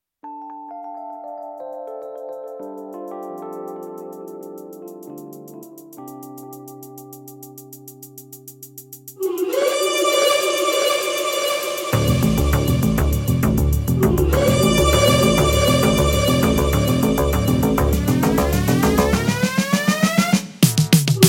Theme - Instrumental

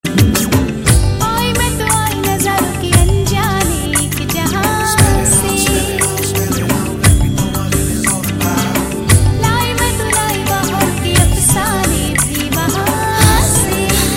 Female

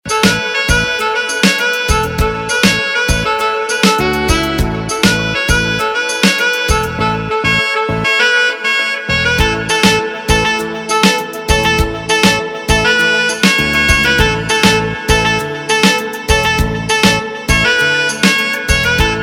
Instrumental Ringtone; Bollywood Hits (2014)

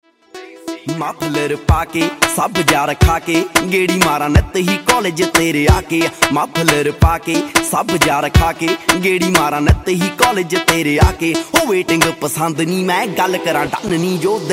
Picka Ringtone

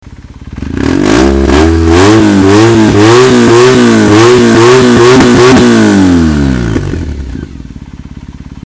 Theme Melody

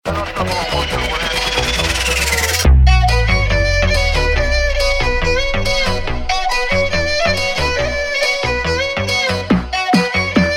Theme Ringtone; Bollywood; Ranchi Diaries

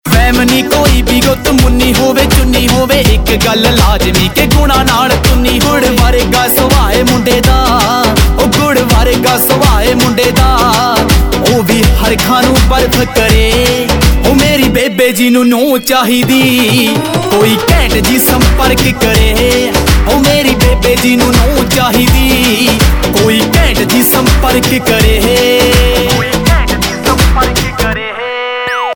Ringtone, Punjabi

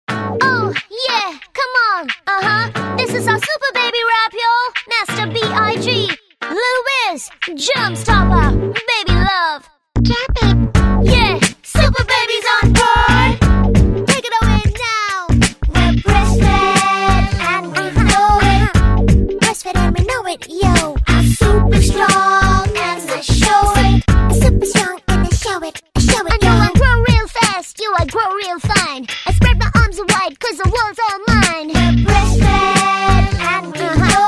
breastfeeding ad rap song; Superbaby Nestle Start Stay Healthy

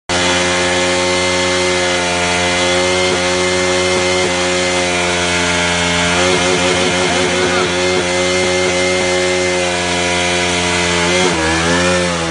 Bike Sound

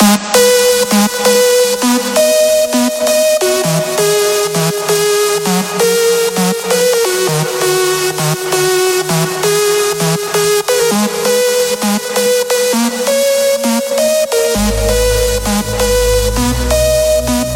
Sound Of The Drums

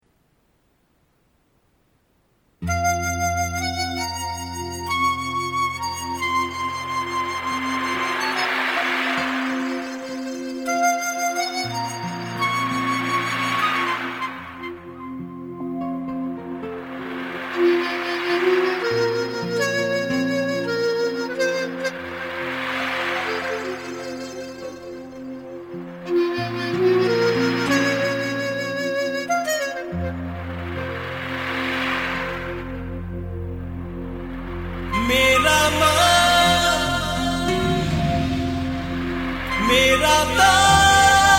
Instrumental; Taarzan - The Wonder Car (2004); The Roar Of Tarrzan

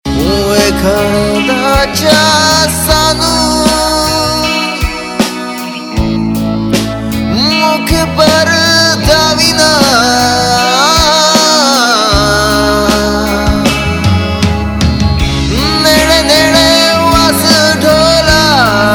Sad Tone Ringtone; Punjabi

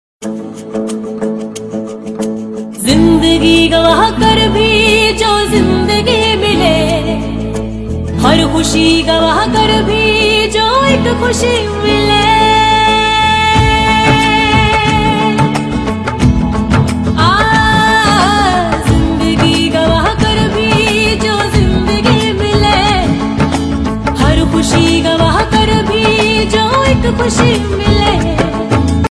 Slow N Sweet

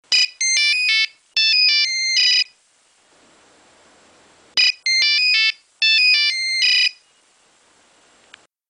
Nokia Robo Tune, Ringtones

